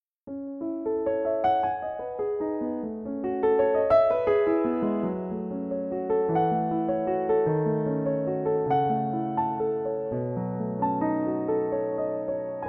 Arabesque No. 1 (Bluethner soft bright Hall)

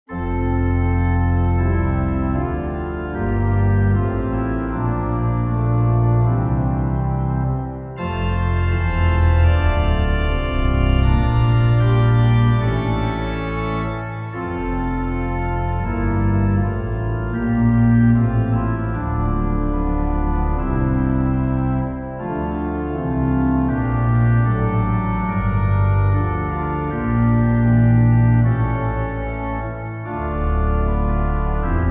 An old Finnish psalm tune, Organteq

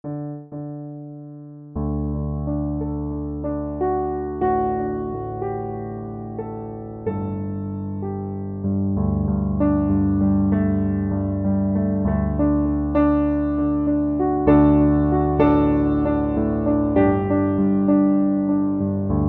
Cantabile 4 way split Baldwin EQ; Creart

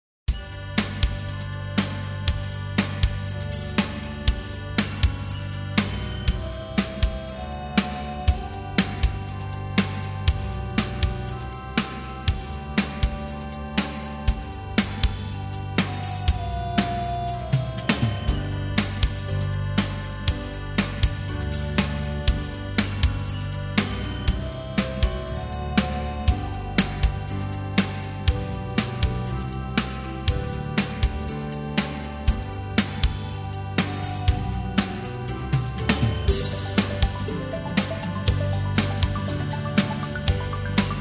organ reeds

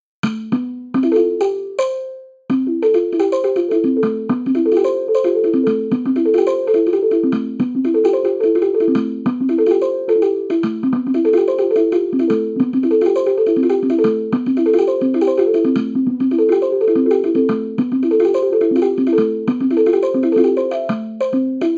kalimba thumb piano wooded

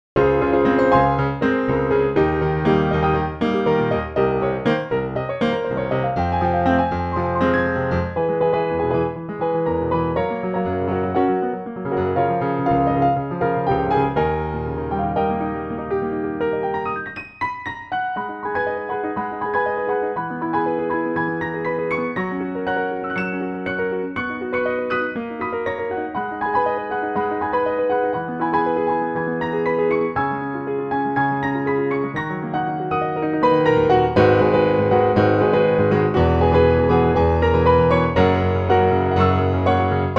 NY Steinway Model D; Fast Piano Stuff

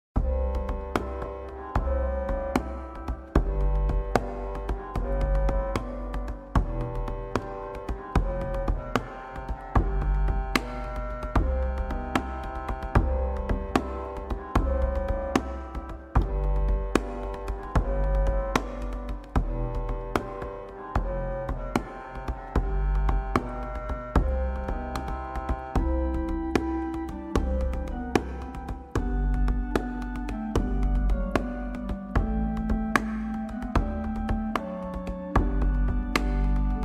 organ reeds

